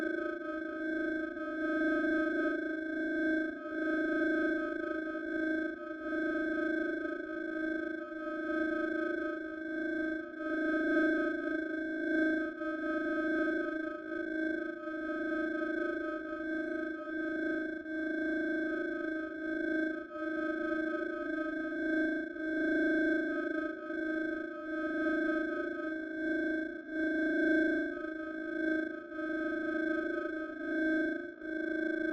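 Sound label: drone hover distant loop; Titanfall 2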